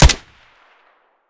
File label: gyrza silenced close; Escape from Tarkov